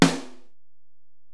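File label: Acoustic Snare, Alesis DM-PRO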